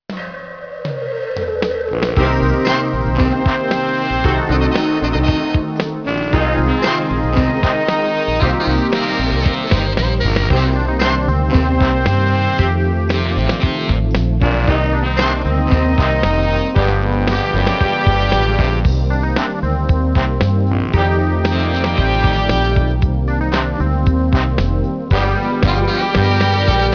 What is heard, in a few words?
Sax; rayman nintendo ds